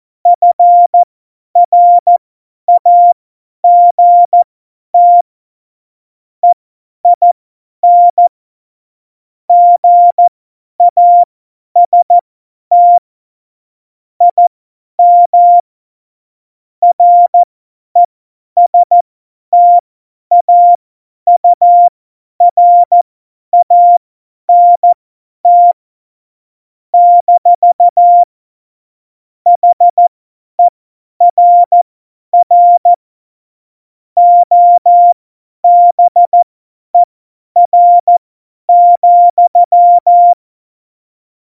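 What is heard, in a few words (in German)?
Witz, cw morsen; Morsekurs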